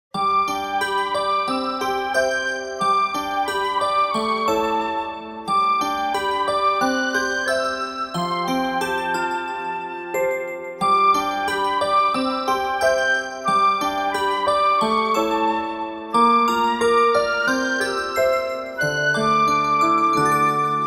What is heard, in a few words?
Bgm Gameover; etrian odyssey v 3ds gamerip 2016